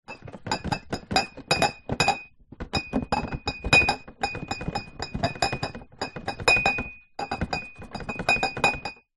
food drink beer bottles full in cardboard carrier shake rattle movements; zapsplat